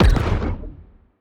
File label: laser hit, weapons; Stellaris; biogenesis; fire rate